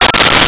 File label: beeps misc